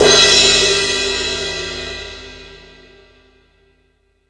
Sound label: Ambient Rock, Drum Machines